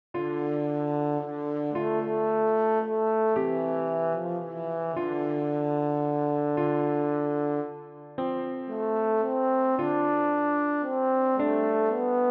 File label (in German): Scarborough Fair Posaune, Klavierbegleitung